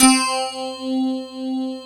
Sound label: FM ELP C3 L, Keyboards Old School; USB Soundscan vol.09; AKAI; FM ELP 7